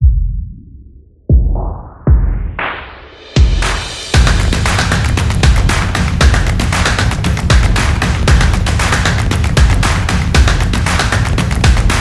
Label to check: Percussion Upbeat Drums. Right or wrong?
Right